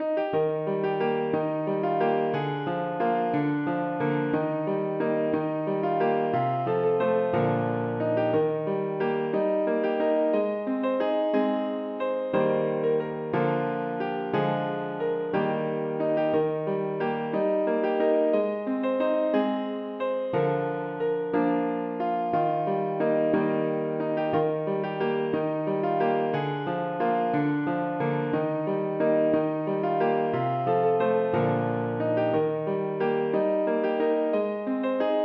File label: piano